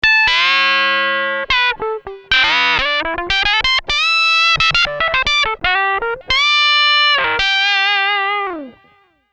Under The Knife

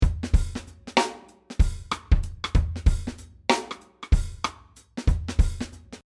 West Coast Funk 95

